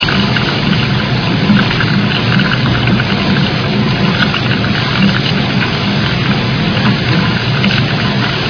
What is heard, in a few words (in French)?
rouet